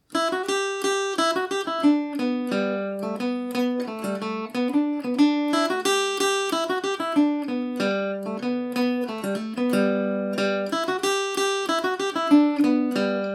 belfast march gmaj, mandolin; The Belfast March

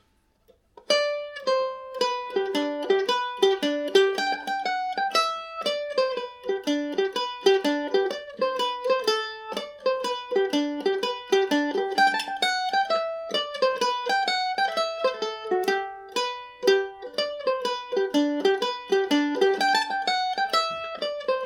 hornpipe, irish mandolin; The Greencastle Hornpipe; G&O #34 mandolin

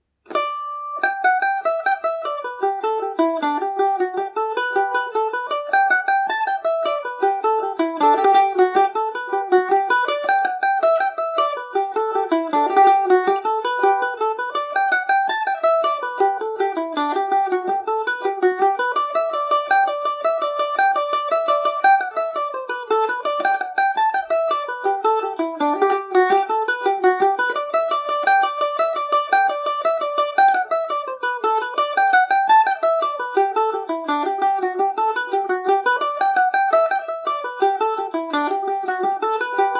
blackthorn stick revisited gmaj, mandolin; The Blackthorn Stick; Falder mandolin

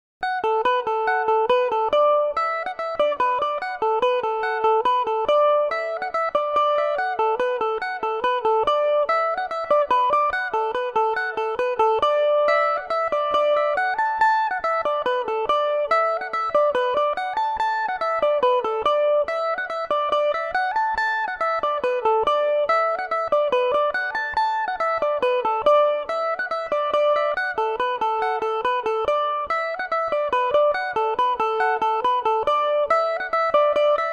egans polka dmaj, mandolin

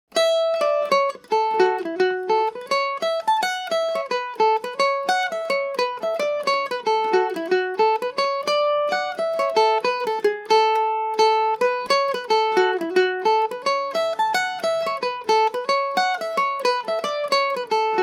jig a major, mandolin; The Ravages Of Time; G&O #34 mandolin

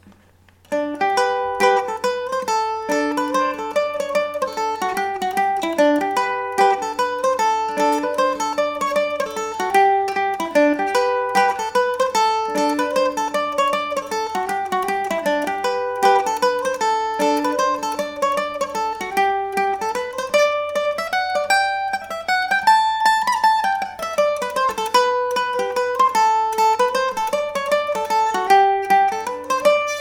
mandolin; long haul

